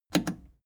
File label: plastic button scratch smooth click enable fast